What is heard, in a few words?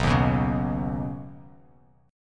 B Major Add 9th, Badd9 chord, Bass Standard; strummed; JGuitar